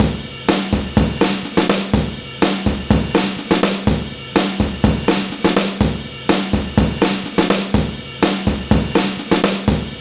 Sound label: TRAILER BREAK 124 bpm; POTBreaks